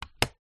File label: household picture frame small face down on table hide photo